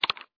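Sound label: new message; hbchat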